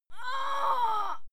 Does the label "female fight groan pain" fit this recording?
Yes